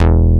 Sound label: MINIMOOG; Greytsounds VOL-1